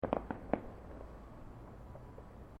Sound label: Fireworks Multiple Crackles; Fireworks (Sound Effects:Backgrounds); Crafted Signals